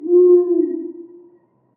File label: Owl Hoot F